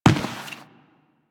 footstep; Step Default, Footsteps; Warframe; Teshin

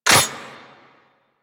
Auto Crossbow Mech; Warframe; Tenno

